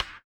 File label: BUZZER; Spectrasonics; Metamorphosis